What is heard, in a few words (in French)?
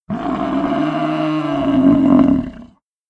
buffle